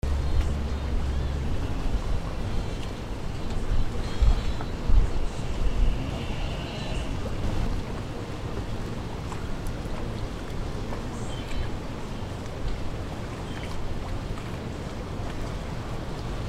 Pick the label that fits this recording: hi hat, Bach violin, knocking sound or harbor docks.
harbor docks